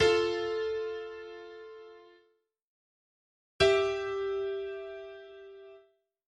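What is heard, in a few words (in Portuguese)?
intervalo harmônico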